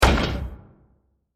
Small Metallic Impact; Bursts; Impacts; Crafted Signals

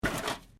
Can Softdrink Rubbish

